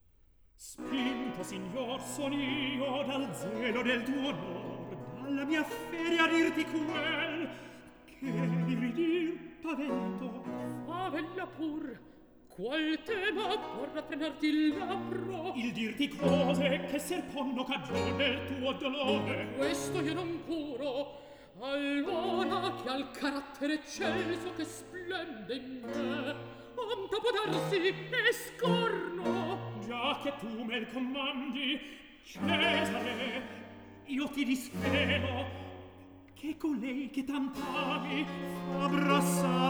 Recitativo; Atto II Scena 1; Decio, Ottone; Antonio Vivaldi; Ottone in villa (2010)

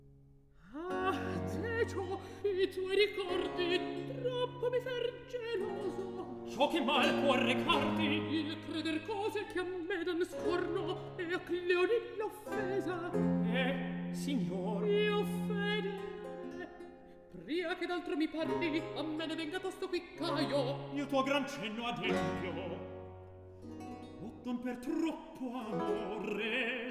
Recitativo; Atto II Scena 9; Decio, Ottone; Antonio Vivaldi; Ottone in villa (2010)

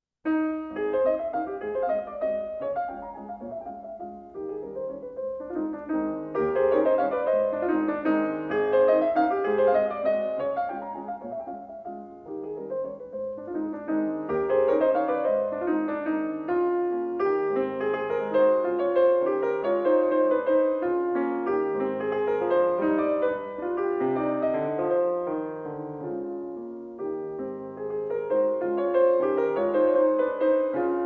Mazurka No.29 in A flat major, piano

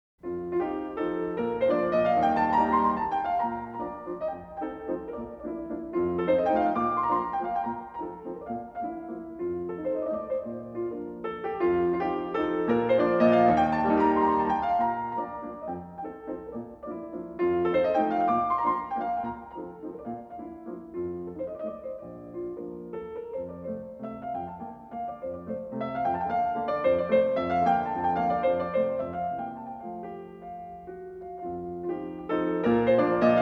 Mazurka in B flat major, Piano

